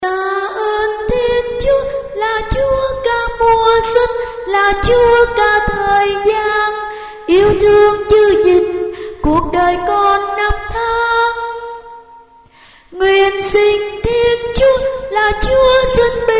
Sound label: tenor